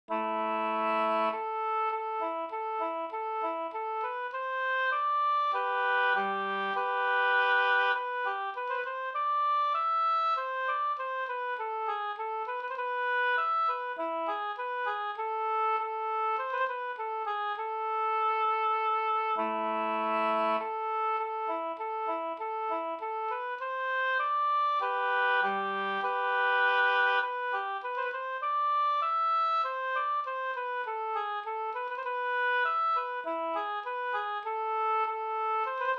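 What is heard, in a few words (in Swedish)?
brudmarsch Dalmarsch Uppland; Marstalla-Olle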